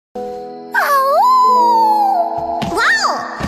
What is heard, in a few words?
Awoo